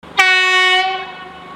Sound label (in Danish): floejte